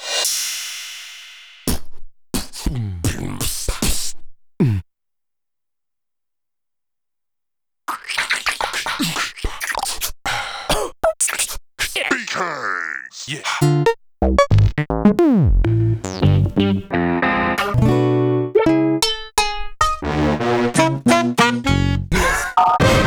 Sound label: Zoom SB-2462